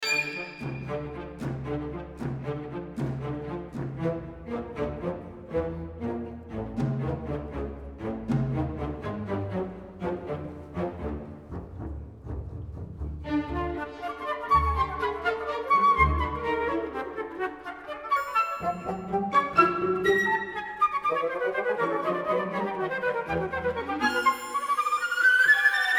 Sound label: flute concerto vivace